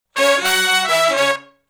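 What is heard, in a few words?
Shuffle Blues Riff (Db) unison, Horncraft for R&B; Sections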